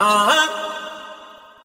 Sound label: "uh ah" SUNG; ooh ahh